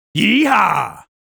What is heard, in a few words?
Yeehaa